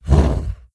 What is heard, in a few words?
Crusher Attack; AnubarCrusher